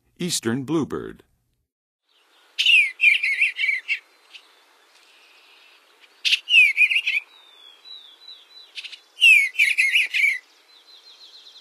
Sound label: Eastern Bluebird, Songs of Birds; North America; Western USA; Western USA Songbirds